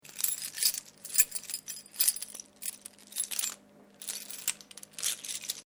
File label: KEYS JINGLE; Jangling; Objects